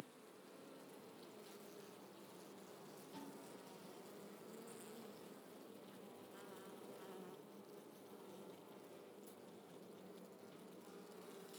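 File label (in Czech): vcela, bee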